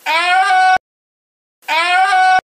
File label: aaaaaaaaaaaaaaaaaah